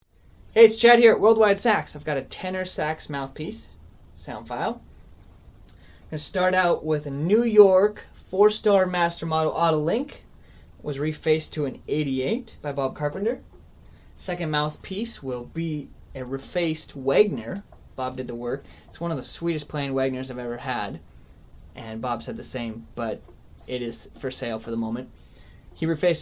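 Tenor MPCs Otto Link 4 star Wagner Selmer Soloist C star, tenor saxophone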